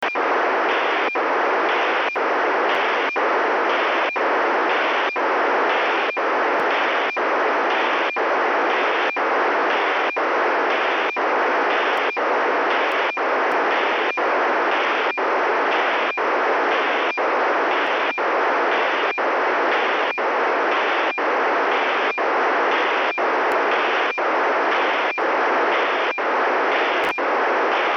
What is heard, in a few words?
radiosonde; lieksa; oh7fes